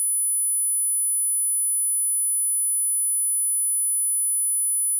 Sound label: saw 10khz -20db; saw wave